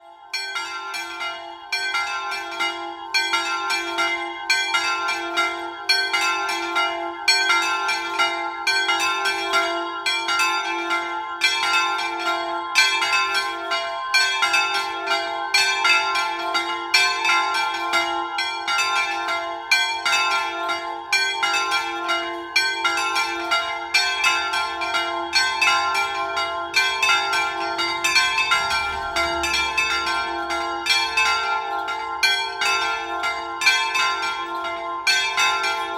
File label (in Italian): Ciago, campane